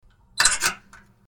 Camera Beep With Shutter

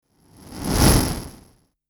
Aggressive, transition

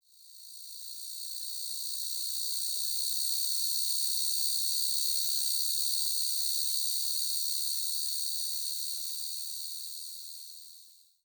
crickets singing in a jungle at night

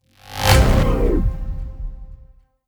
Cinematic, transition

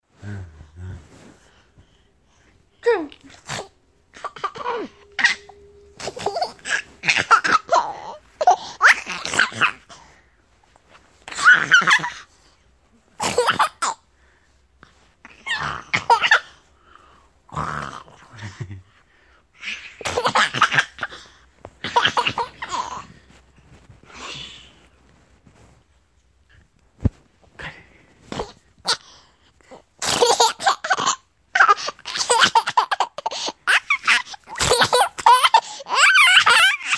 baby laughing

